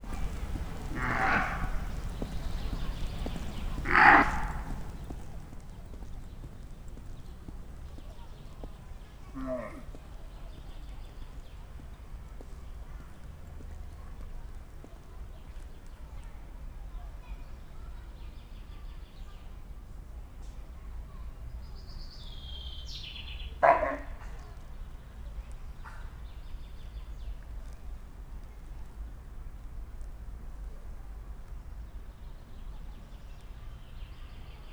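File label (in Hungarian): hangoskodik, pónió; szegedi zoo 2011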